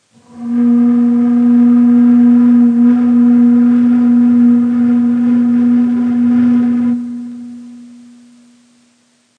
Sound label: Pes Zirgule, Ney